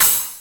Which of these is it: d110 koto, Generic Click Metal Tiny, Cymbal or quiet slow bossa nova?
Cymbal